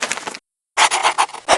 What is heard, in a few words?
sketch; vera